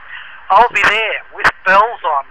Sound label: bells